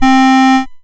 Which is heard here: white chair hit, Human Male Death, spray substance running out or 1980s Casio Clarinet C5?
1980s Casio Clarinet C5